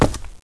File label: concrete; valve